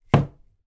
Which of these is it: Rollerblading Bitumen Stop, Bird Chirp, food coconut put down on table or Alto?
food coconut put down on table